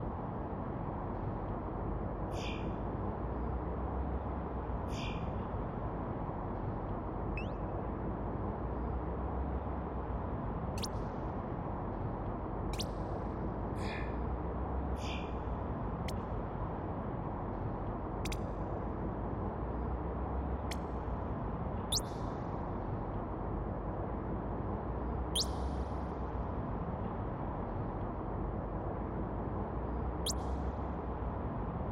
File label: Background Loop